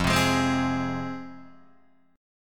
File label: F Minor, Guitar Open D